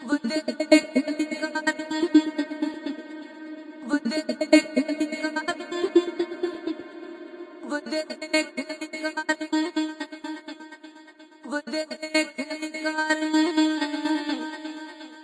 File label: stuttery spacey indian vocal; VOCAL OR VOXY LOOPS